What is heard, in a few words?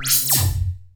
TECHFX, Hyper Dance EFFECTS; Best Service